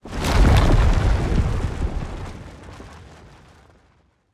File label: avalanche full